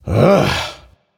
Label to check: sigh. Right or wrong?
Right